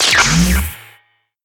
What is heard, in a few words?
power on